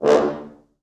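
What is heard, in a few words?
French Horns staccato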